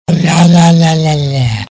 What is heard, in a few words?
lalalalala